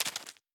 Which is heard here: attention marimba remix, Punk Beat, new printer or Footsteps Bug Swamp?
Footsteps Bug Swamp